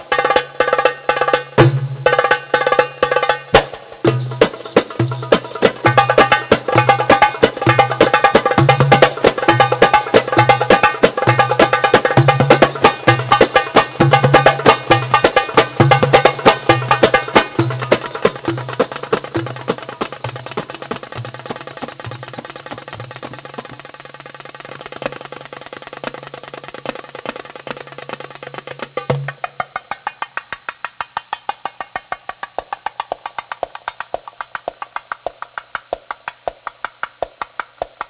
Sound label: Jasmine Drum Sample, belly dance